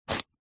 food turmeric or ginger raw single slice knife

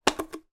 toy water pistol large cap open

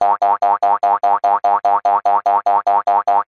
cartoon bounce jump hop several frog fast

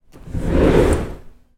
household door wooden sliding open

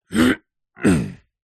human male 38 years old clear throat

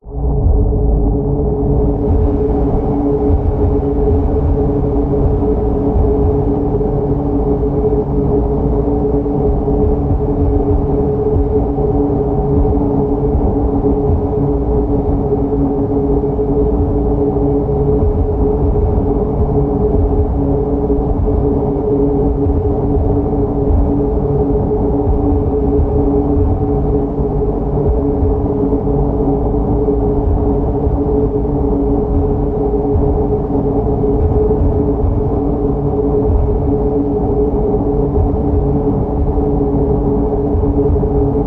science fiction machine loud whir drone spacious; Audio Mangler

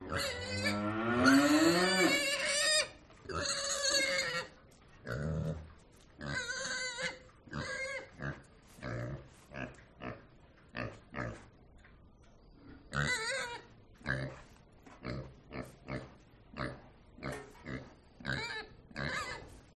urban farm pig squeals and cow

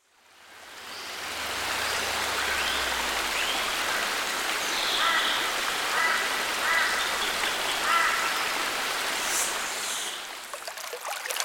subtropical rainforest creeks waterfalls; zapsplat

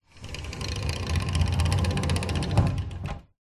household door wooden rolling screen roll; zapsplat

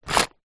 tent pegs in pouch pick up from ground; foley

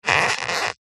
foley plastic squeak creak tighten turn; zapsplat

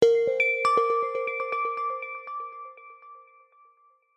multimedia musical logo minimal bell delay; zapsplat

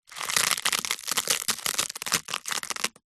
crackers squash crack break crush

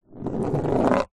office pencil roll on empty cardboard box

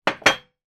household coffee mug down on glass table

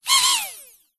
drone micro motor no props whirr very short burst; vehicles

